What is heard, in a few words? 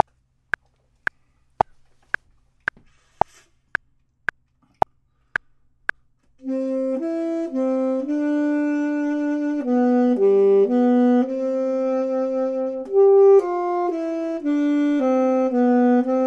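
bottom, Saxophone and Clarinet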